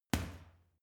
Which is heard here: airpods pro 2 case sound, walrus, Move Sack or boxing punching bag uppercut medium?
boxing punching bag uppercut medium